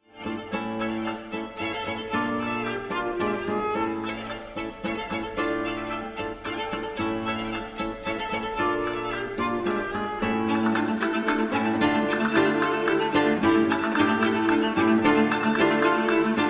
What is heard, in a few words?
quintet in d major; luigi boccherini; cuarteto casals; carles trepat; david tummer